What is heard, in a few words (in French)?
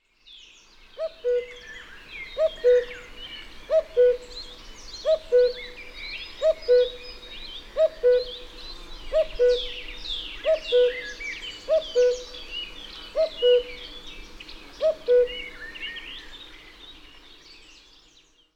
coucou gris